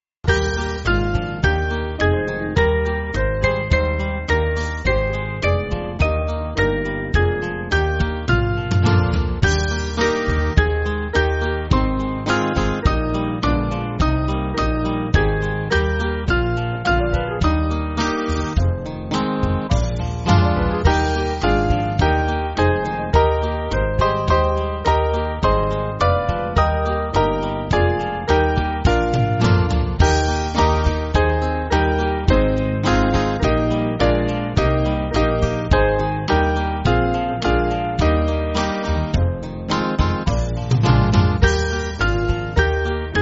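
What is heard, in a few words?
Band, Music Backing Files for Churches; Small Band; Jesus Calls Us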